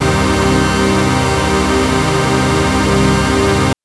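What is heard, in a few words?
Synth Ensemble minor c2; TrashLibrary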